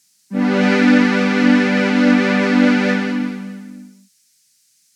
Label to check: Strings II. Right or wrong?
Right